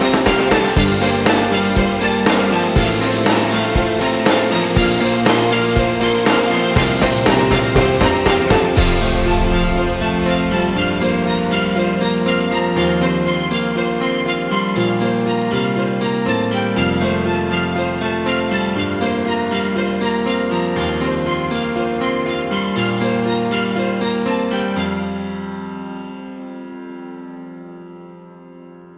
violin ringtone

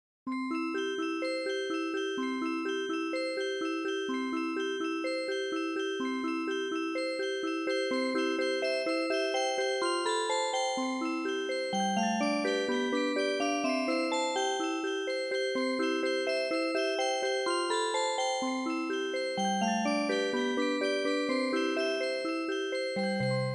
music digitized version

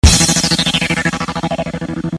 rock sms tone

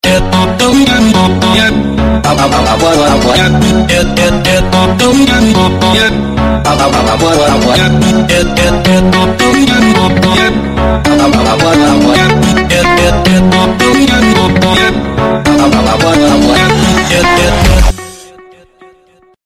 dance electro remix

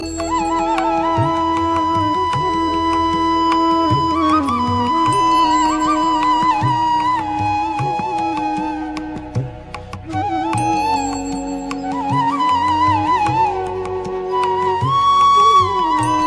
flute ringtone

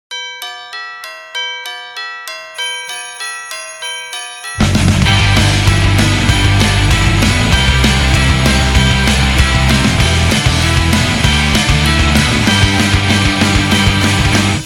christmas rock

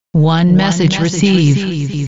sms space

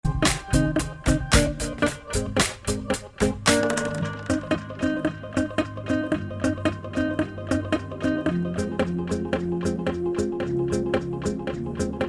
minimal techno

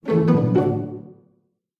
sony ericsson xperia sms

